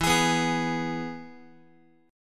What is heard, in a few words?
E-Major E, Guitar; strummed; JGuitar